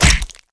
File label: leap hit, weapons